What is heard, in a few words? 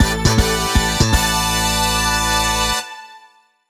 Stage clear（ステージクリア）; Horgihugh